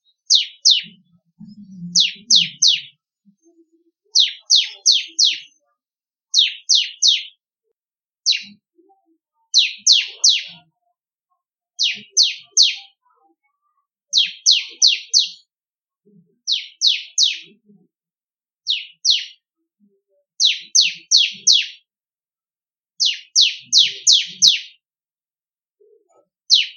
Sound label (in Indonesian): Suara Masteran Burung Ciblek Kristal Merdu